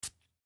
Cologne Spray; Household; Primping; Sound Effects:Human

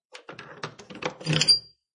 Heavy Key Move And Pull From Lock; Lock Sound Effects; Objects

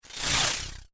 Sharp Gritty Future Flyby, whoosh; Whoosh Sound Effects; science fiction sound effect; Andrew Fleming

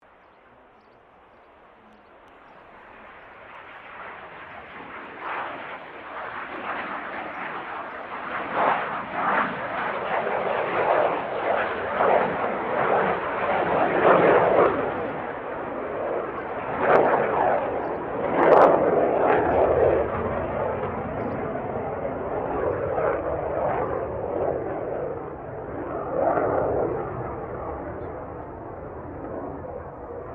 AIRCRAFT CA-27 SABRE TAKEOFF; CA-27/F-86 Sabre; Airplanes; Vehicles

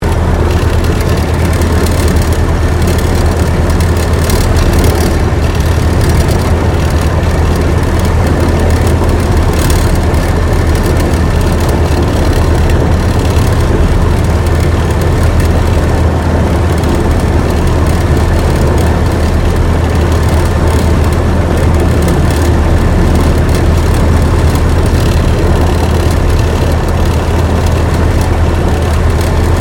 AIRCRAFT T-28D TROJAN IDLE INTERIOR; Airplanes; Vehicles

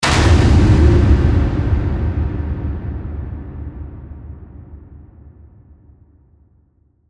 Hit Slam Eerie, Dark; Production Element, Imaging Element, Accent, Transition; BlastWave

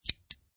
USB Retract; Footstep - Man wearing jeans and hiking shoes jogging on carpet surface - variation 2; Footsteps; Human

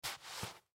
Footsteps Work Boots Single Step Left On Sand; Foley; Blastwave

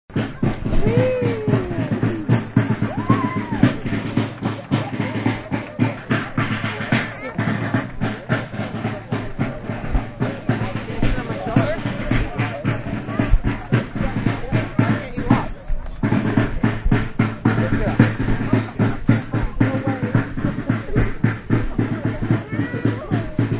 Marching Snare; Parades (Sound Effects:Backgrounds)

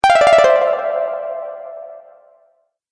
Magic Bright Bonus, video game

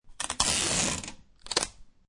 Tape Dispenser, Pull tape off tape dispenser; can also be a general ripping or tearing sound; Office

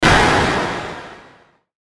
Hit High Burst Warble; Blastwave

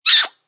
Big Lip Kiss; Kiss sound; Kissing

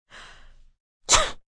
Sneeze Light, Female sneeze, with inhale; Sneezing; Voice Box

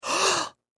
Human Male Gasp; Gasping; Voice; SFX Bible

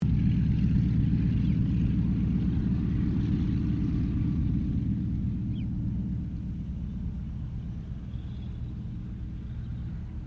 AIRCRAFT CA-13 BOOMERANG TAXI; Interior; Airplanes

